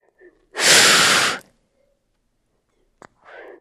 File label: Lizard Beaded Breath